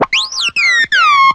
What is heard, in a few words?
shields down, astromech; gmod; lvs star wars; naboo n1 starfighter